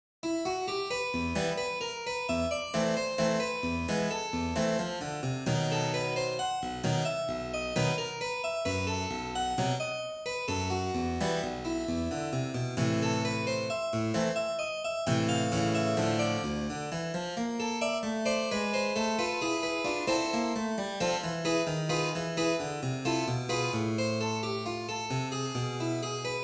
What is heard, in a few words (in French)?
Milonga valse clavecin solo